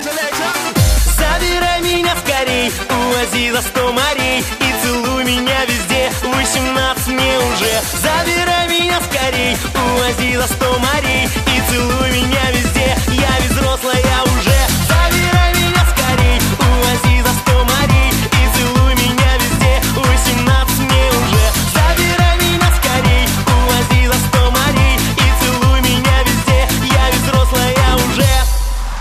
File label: pop music